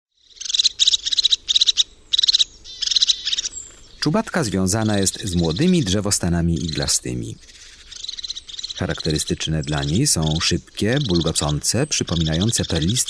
CZUBATKA, Ptaki; Kurs PTTK

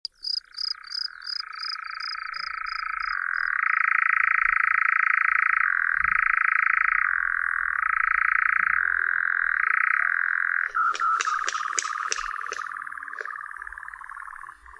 Lelek; Kurs PTTK; Przyroda; Tajemnice puszczy

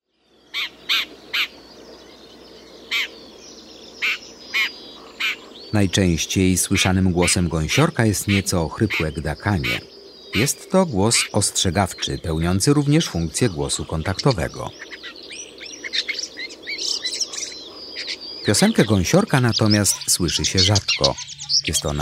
Gąsiorek, Ptaki; Kurs PTTK

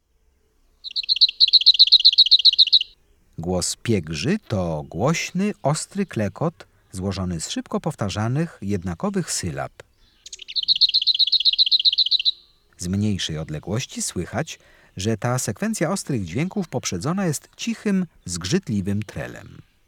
Piegza, Ptaki; Kurs PTTK

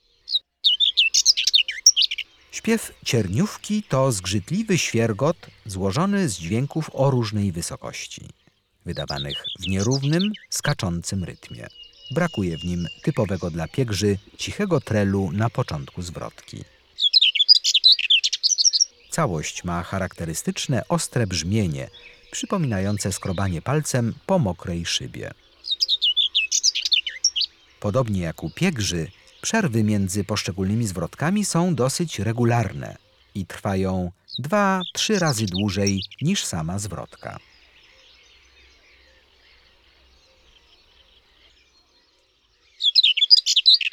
Cierniowka, Ptaki; Kurs PTTK

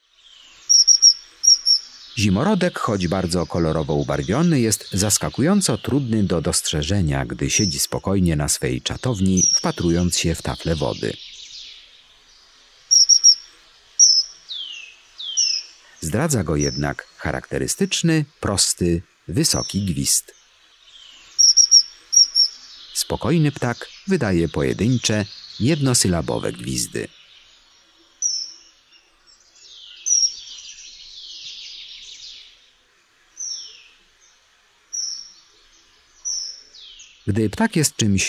ZIMORODEK, Ptaki; Kurs PTTK

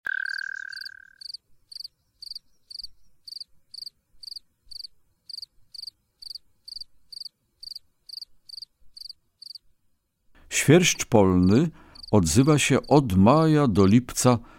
Swierscz polny; Kurs PTTK; Przyroda; Tajemnice puszczy

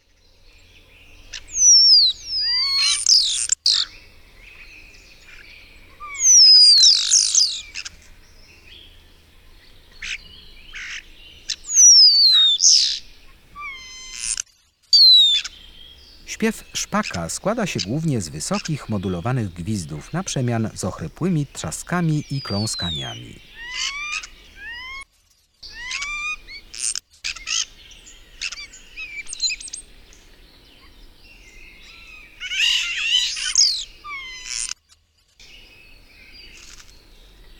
Szpak, Ptaki; Kurs PTTK

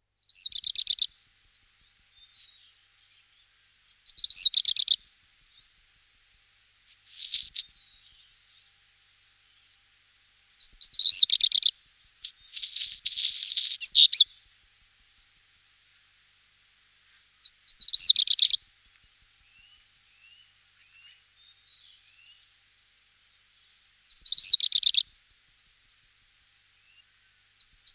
Kopciuszek, Ptaki; Kurs PTTK; Przyroda

